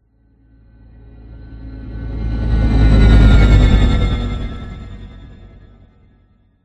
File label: Passby Designed Whoosh Futuristic Scifi Airy Synth Spaceship Medium; PMSFX